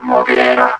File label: MOGUERA Roar; Unleashed